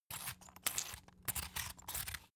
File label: salt mill squeak close sequence; berufe; gastronomie